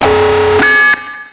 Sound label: bomb warning, Pneumatic siren; mvm; Pneumatic siren general